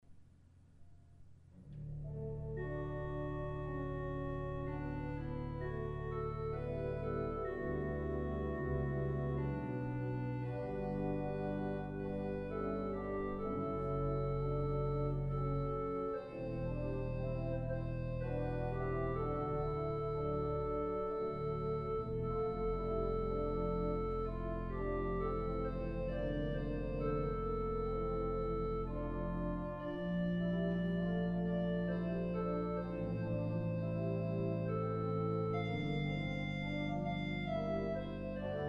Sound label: Instrumental